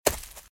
Footsteps - Leaves - Run - Left; RadwinIsland

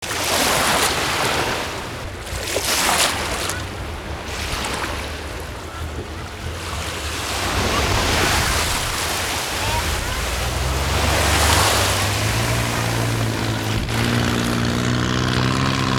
boat away beach; best service Blue Box; sea traffic

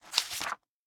Book Flip

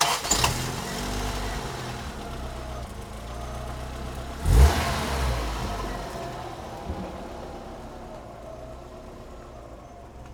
car start go, street traffic; best service Blue Box

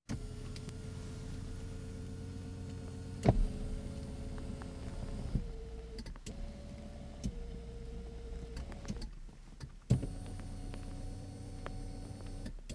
Automobiles various

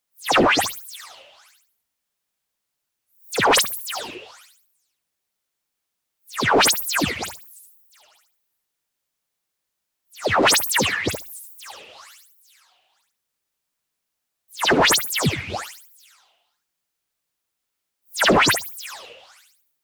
movement fast, filter slash; boom library; Anime Essentials; DSGNMisc

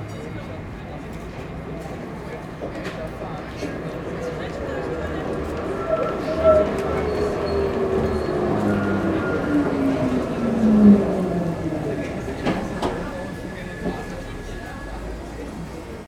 tram ambi; best service Blue Box

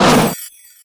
Creature Melee Magical; Kilta

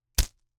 slingshot, dry firing, slap, rubber, rustle, shot

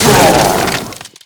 Shatter Zombie In Ice; Destruction

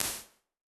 2000 SN SHORT, Impulse Responses; Reverbs; PastToFutureReverbs; SONY; CLA; IRs

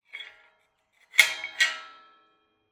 Iron gate, latch, sliding, rotating, lock, clang; Eiravaein Works; Vaeyan IV

